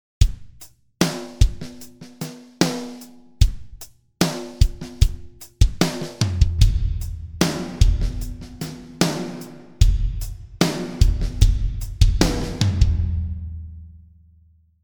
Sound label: Drums Impulse Response Hall